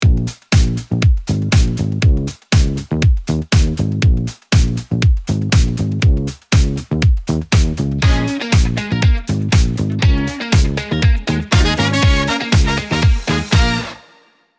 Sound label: music